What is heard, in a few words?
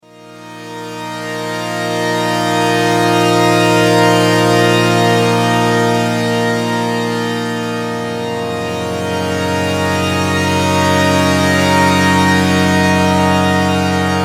techno collection